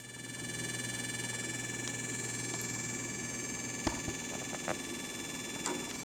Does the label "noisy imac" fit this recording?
Yes